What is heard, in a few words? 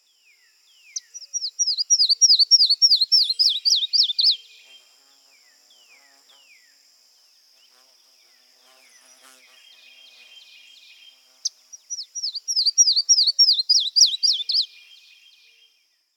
yellow-throated warbler